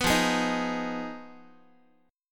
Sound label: F Minor 7th Flat 5th F down Guitar; strummed; JGuitar